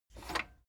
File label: cassette eject release